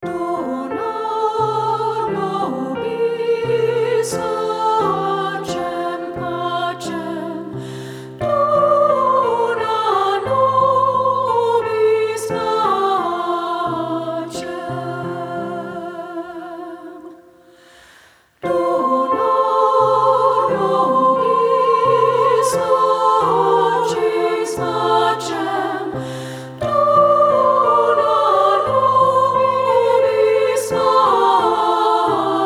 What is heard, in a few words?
UNISON; DONA NOBIS PACEM